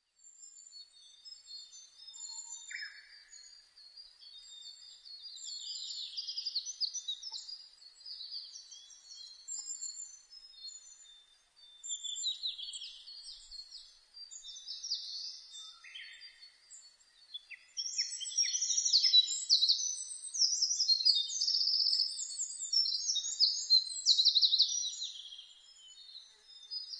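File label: birdsong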